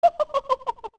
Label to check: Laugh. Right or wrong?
Right